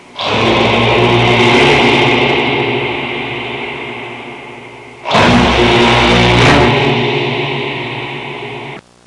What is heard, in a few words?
chanting chorus intro